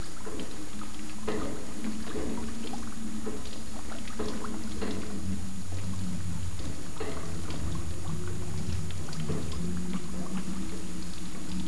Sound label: water; quake2; coop